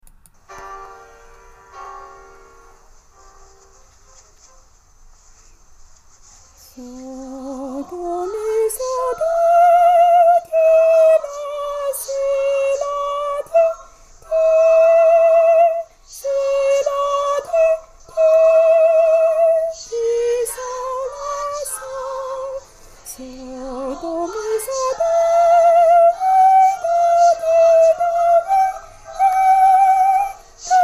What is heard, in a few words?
bd soprano